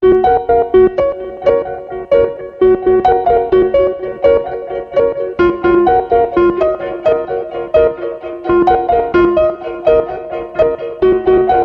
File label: Harps Ambient